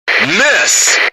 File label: Miss